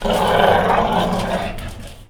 DOG; 3D Sound - 3D Keys VOL-1; MEAN 3D

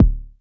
Tech bd, Jomox Airbase99

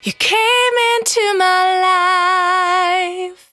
Cry 68bpm B, Vocal; Zero-G

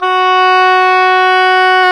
oboe; Roland LCDP04 Orchestral Winds; Oboe Brth 1

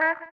VIBRA WAH, guitar; Spectrasonics; Bizarre Guitar

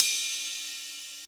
HH, HIHAT HIP; 90 s Sample CDs; Best Service Dance Mega Drums

